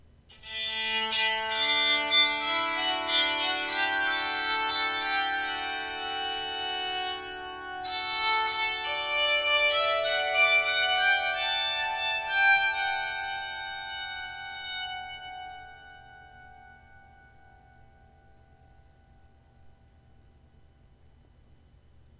song, bowed psaltery

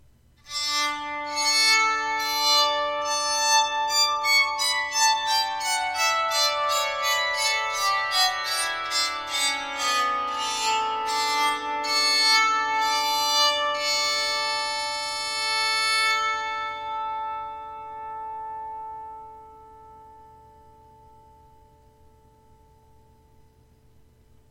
scale, bowed psaltery

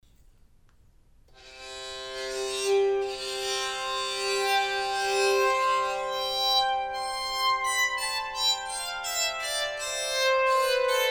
chestnut bari sound, bowed psaltery, Baritone

